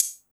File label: HH, Drum & Bass, 160 BPM; Ueberschall; Drum & Bass Flash Trackz